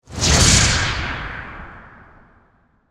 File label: Magic Wand Cast, Horror, Magic, Wand, Cast, Spell, Heavy